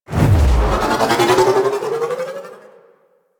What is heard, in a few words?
Ship Boost; Warframe; DE; Vehicles; RailJack; RailJackShip